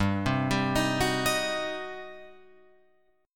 G Major 7th Suspended 4th Sharp 5th, G, down, Guitar, Open F; GM7sus4#5 arpeggiated